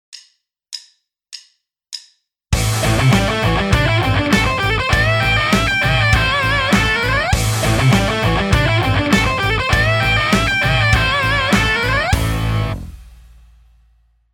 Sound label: pentatonic rock lick, guitar; 30 day guitar challenge